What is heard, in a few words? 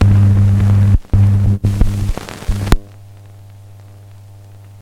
cadenced buzz hum; sonniss gdc